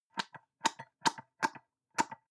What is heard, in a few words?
soap dispenser press multiple almost empty; haus-garten; badezimmer